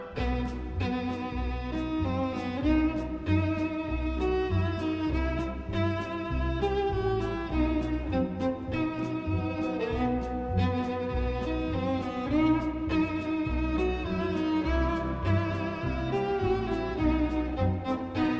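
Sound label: hava nagila instrumental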